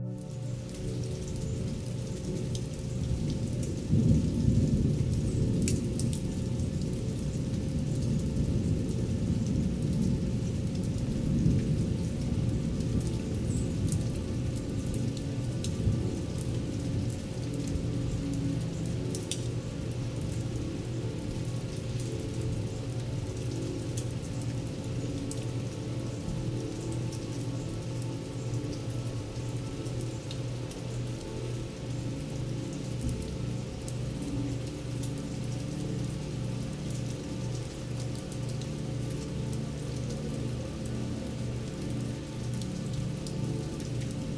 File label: Thunderstorm Delta; Sleep; Sound Baths